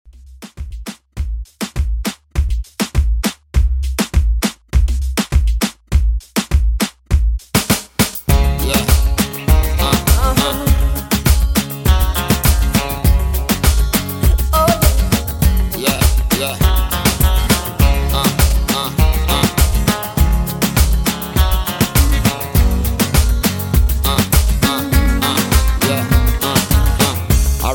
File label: 90s Reggae 101Bpm; djyan